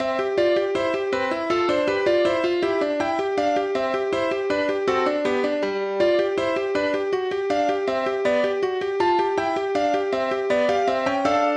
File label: Melody